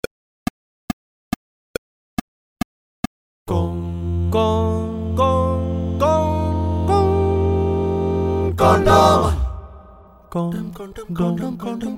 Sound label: ringtone